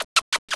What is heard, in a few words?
whistle; CATZ; KITTENZ